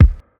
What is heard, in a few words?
original kick single shot d key